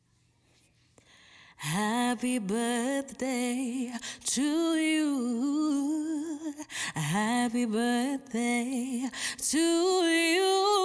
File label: Happy Birthday Song in English Female